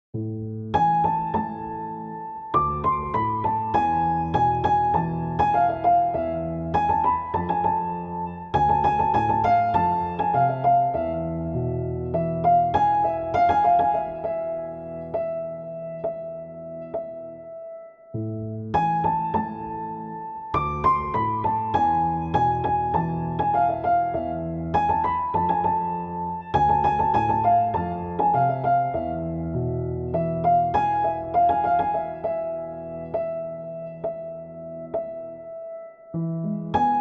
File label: piano tutorial; selanik türküsü